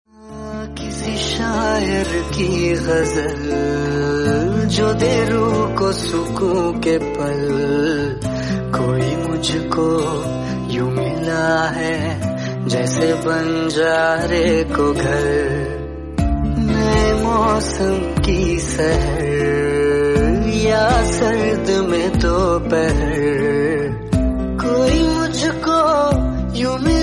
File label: Hindi Song Ringtone